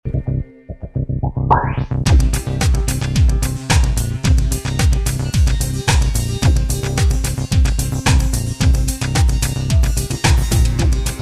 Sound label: loop house